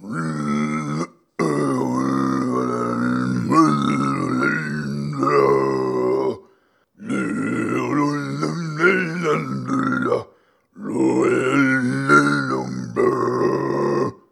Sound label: sing